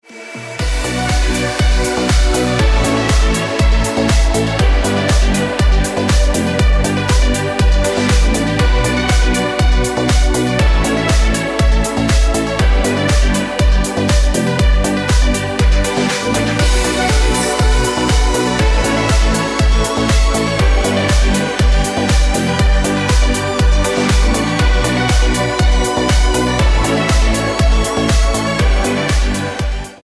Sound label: Ringtone